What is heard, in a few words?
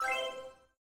positive hit; Blind Drive